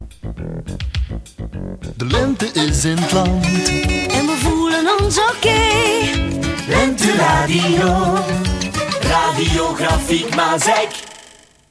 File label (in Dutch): Jingle lente; Seizoenen